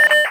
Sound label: talk; Digital Paintball 2